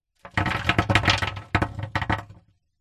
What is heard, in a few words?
horror coffin small stones dirt handful throw onto coffin box being burried; internal coffin